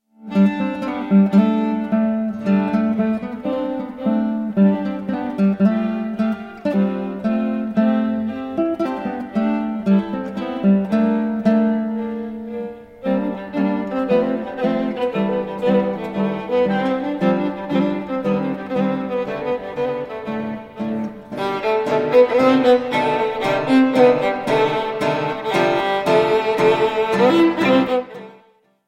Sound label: traditional 3 bulgarian dances arr for violin and guitar; arr wingfield